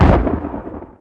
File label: button zap